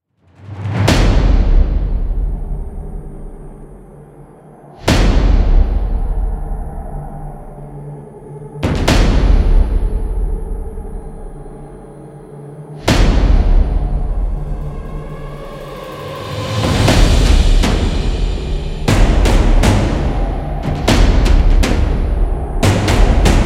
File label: Epic Drums Trailer; intense drum beats